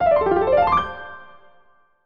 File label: Piano; Animal Crossing